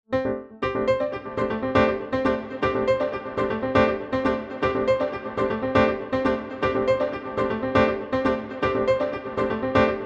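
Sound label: piano; feedback echo plugin; Newfangled Audio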